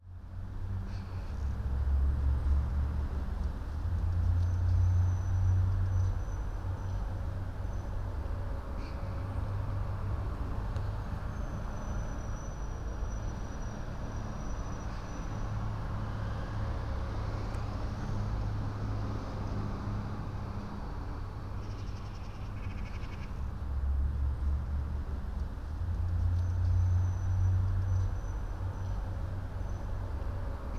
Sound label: NO BELLS, Cathedral Organ; ILIO; Propeller Islands